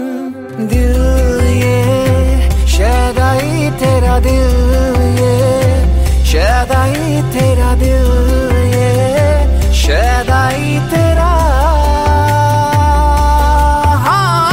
hindi ringtone; bollywood ringtones

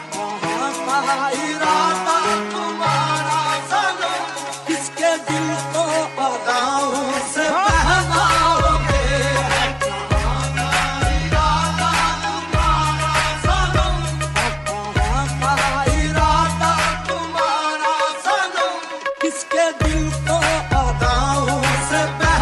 sad bollywood ringtone; english ringtones

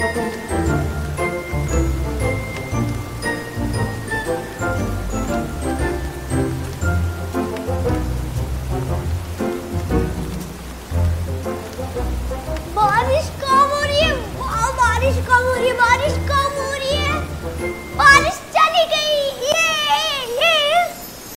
cartoon ringtone

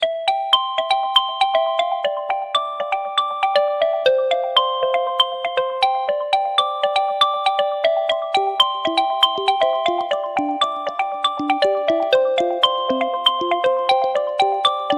xylophone ringtone nokia

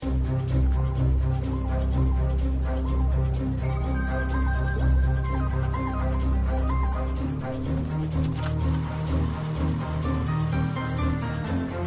galaxy dubstep ringtone

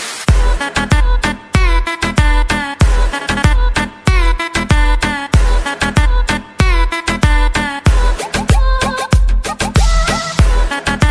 attitude english ringtone

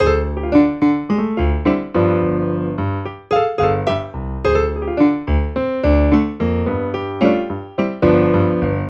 cool lovely cute piano music tone ringtone

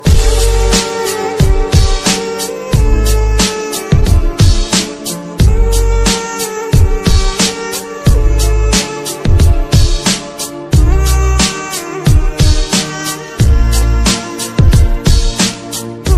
top bollywood music ringtone

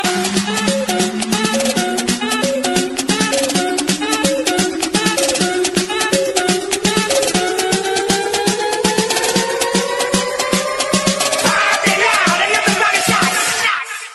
sad english music ringtone; english ringtones

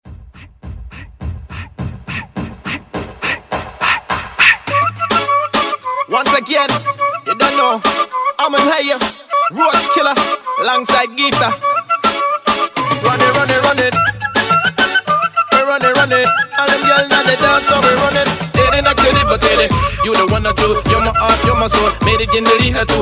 heart beat ringtone

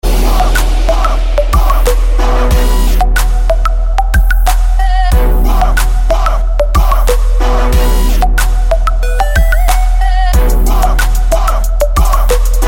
club hit remix call tone

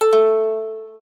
luminous sms tone ringtone

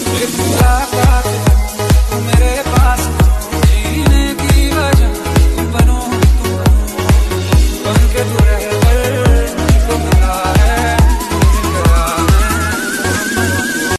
music sweet ringtone, bollywood ringtones

